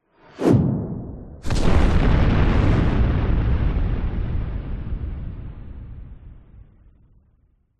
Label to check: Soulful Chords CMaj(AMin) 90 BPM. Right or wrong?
Wrong